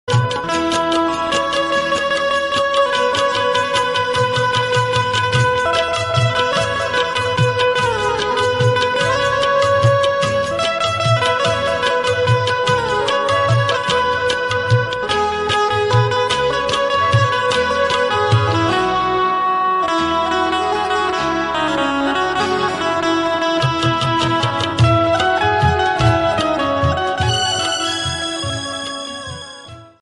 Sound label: romantic ringtone